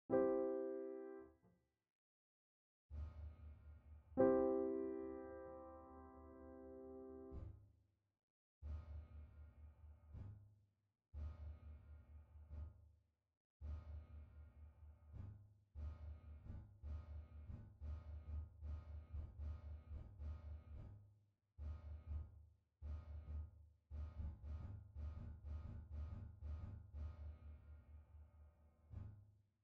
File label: Pianoteq sustain pedal